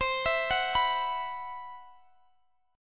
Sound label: C Major 7th Flat 5th C down Guitar Open G